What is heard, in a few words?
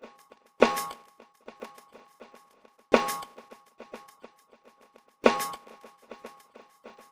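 drum, separated component 4; Separation of Sound Sources by Convolutive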